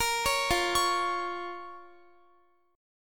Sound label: F Suspended 4th Sharp 5th F, Guitar; Fsus4#5; arpeggiated